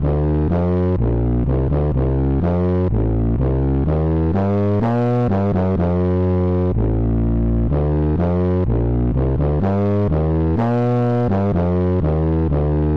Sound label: tuba